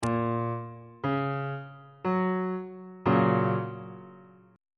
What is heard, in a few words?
f sharp major 1st inversion on bass clef